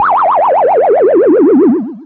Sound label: uauauauauauim (death), atari